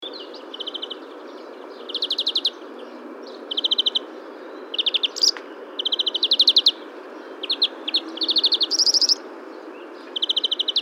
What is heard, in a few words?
European Greenfinch Chloris chloris; chant des oiseaux des jardins